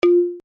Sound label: balafon fa; MOBICLIC 77; RATOCLIC